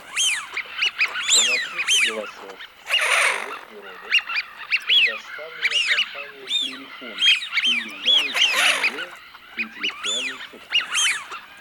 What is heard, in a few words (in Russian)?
Свиязь Fischione; plurifon